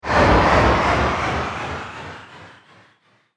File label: Sturdy Sweep High Delay, Whoosh Sound Effects